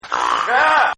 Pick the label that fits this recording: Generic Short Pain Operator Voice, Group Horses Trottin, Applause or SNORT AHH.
SNORT AHH